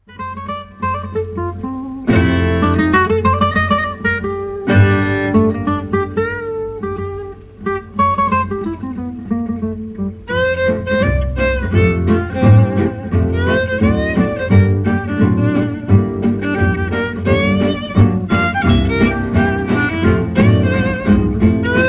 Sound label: 40s Music